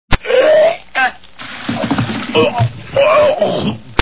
vomit

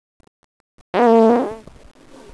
fart

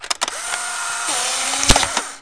polaroid

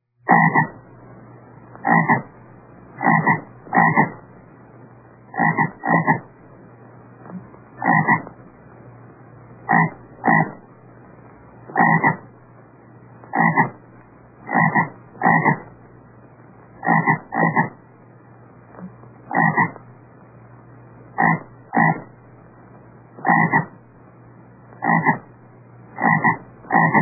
bullfrog

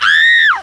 scream

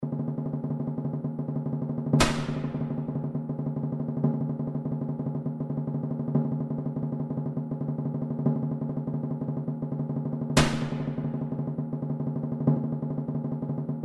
taps, midi

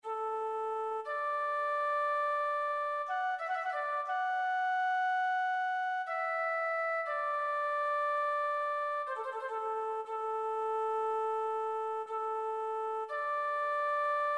amazing grace flute

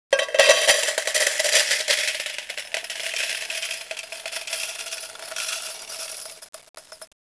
many coin

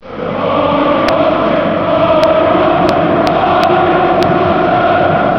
crowd

